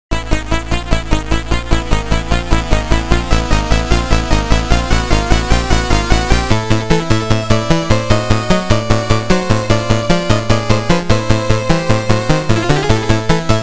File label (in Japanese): 暗黒と緊迫 (沼地ステージ); Akumajo Densetsu sound tracks